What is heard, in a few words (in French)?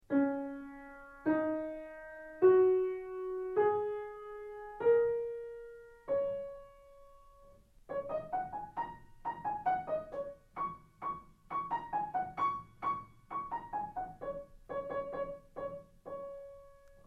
pentatonique